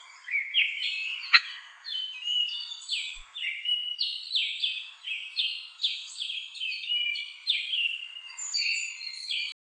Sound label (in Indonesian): burung berkicau pagi